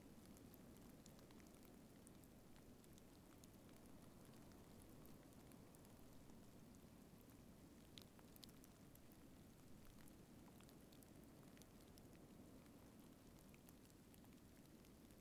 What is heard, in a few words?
Rain No Pops Short; 2016 Steens; Wind and Storms